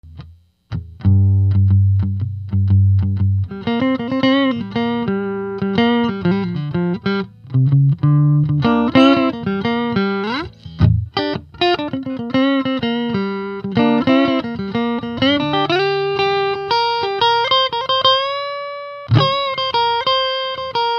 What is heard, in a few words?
6l6gc strat